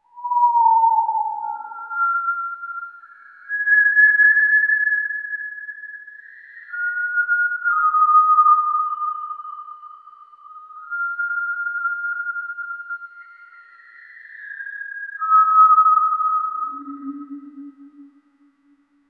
slow haunting human whist; alone

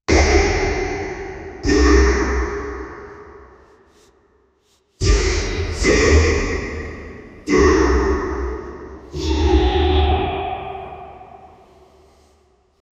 sci-fi mario style death; cartoon

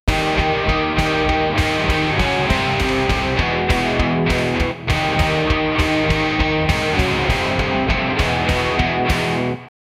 70s rock guitar solo

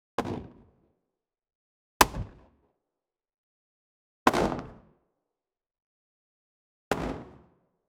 pistol shot close range

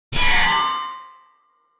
videogame fps kill sound

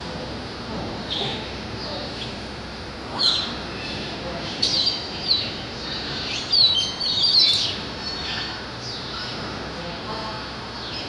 a scary lobby with some, atmosphere

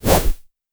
the sound of a synthetic, casual game

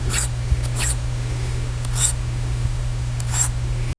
sound logo for a fabric, crafts

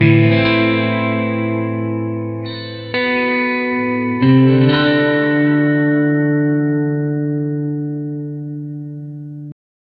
a sad guitar loop

